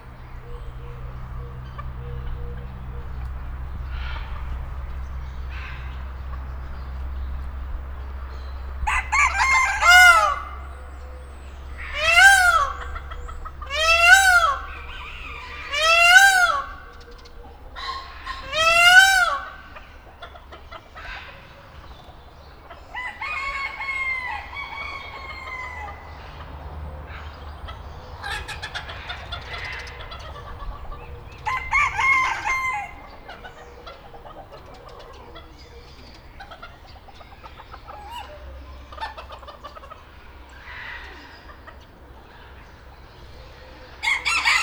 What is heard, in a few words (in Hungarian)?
kakas pava tyuk facan furj, parasztudvar; nyiregyhazi zoo 2010